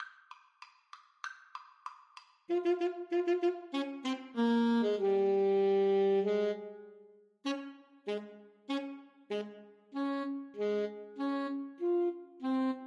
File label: radetsky march, Alto Saxophone Duet